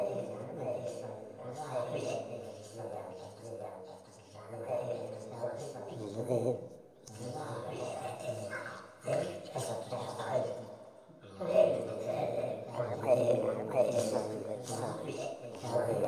crowd murmur loop; SF crowd murmur loop

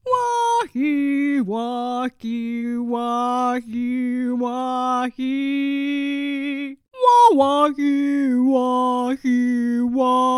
sing

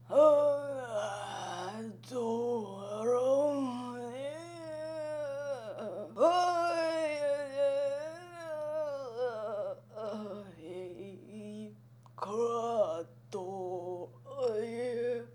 farmer centipede sing

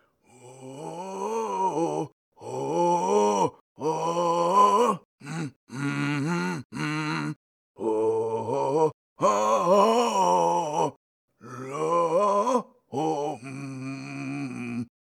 sing

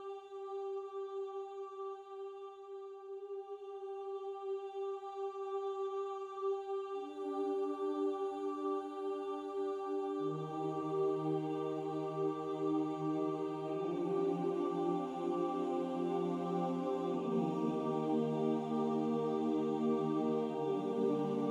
Enclave Split Sub; Hollow Knight: Silksong

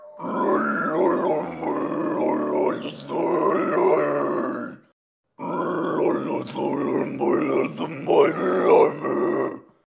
sing; Groal; Hollow Knight: Silksong

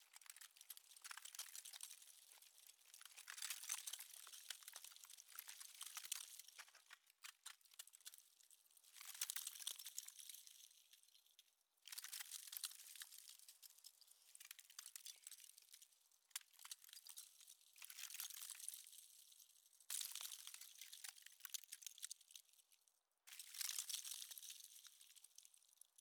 frostbite loop; Hollow Knight: Silksong

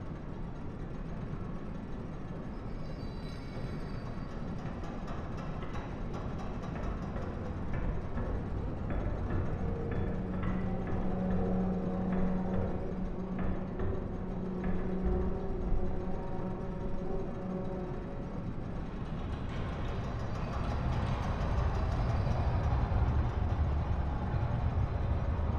atmos under

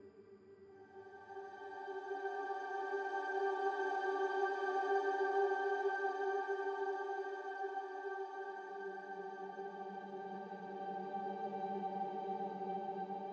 Single Note; Hollow Knight: Silksong; Mistmaze

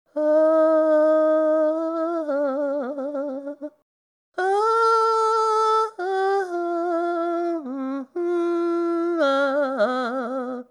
female tired sing; P NPC; Hollow Knight Silksong

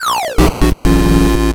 Foul!; 3D Classics Kirby's Adventure

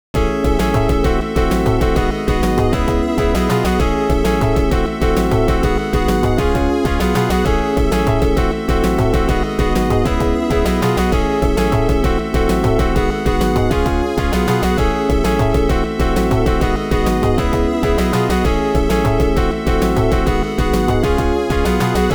Continue; Genesis; Mega Man The Wily Wars; The 16bit Audiophile Project

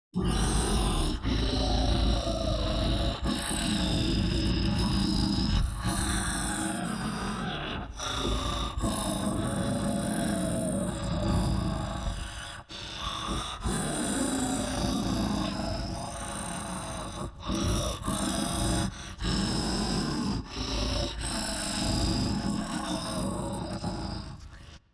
weak silk voice attempt; Hollow Knight: Silksong

